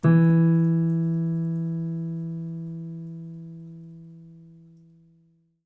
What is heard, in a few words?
piano sample